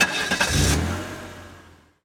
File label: vehicle van ignition